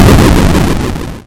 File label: boom; abyss